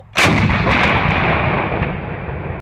88mm flak

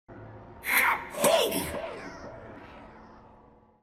kaboom

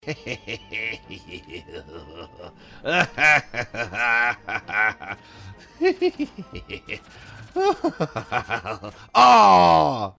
laugh fail

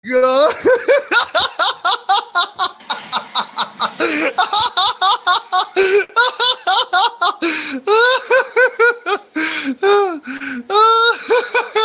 cringe laugh

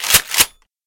pump full

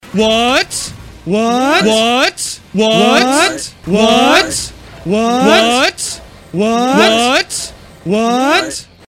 fake reaction

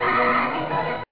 high pitch hallelujah

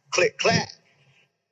click clack

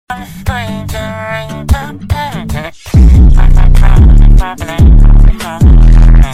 bass boosted

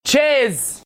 cheerz

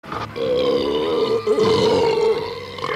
big belch

